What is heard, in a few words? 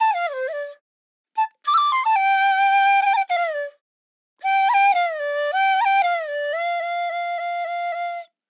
birds flute loop BPM 110; Bihu; waves of bihu vol 2; Gully Loops